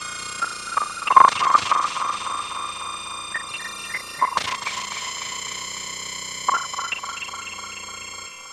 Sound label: Strained Circuits; Sonic Foundry (Sony Creative Software); Loop Noir